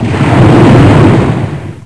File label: wave splash; firearms